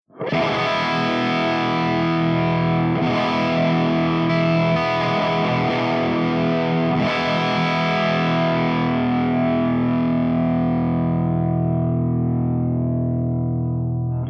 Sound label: MESASTUDIODRIVE V30 HB